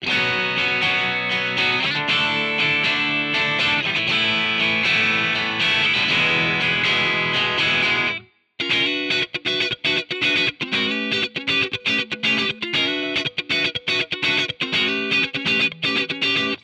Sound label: Amp